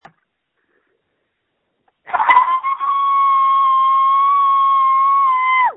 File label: Scream